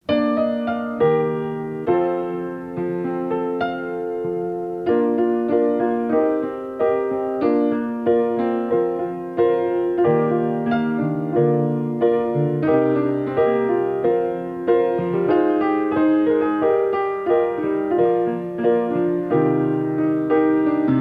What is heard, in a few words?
instrumental